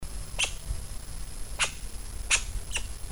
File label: red squirrel